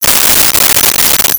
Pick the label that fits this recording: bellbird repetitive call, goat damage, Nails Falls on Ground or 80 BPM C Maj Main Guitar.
Nails Falls on Ground